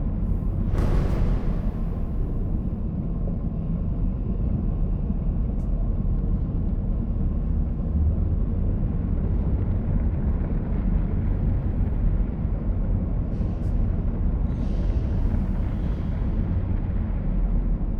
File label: ship mining station idle; aquatics